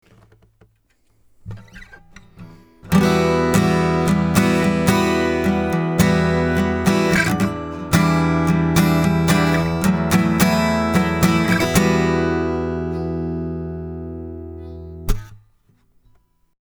Rupert Neve Designs Portico 5017 Steel string blend comp